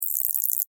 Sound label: Insect Voice Click, Clicks, Vocalization, Bug; Animal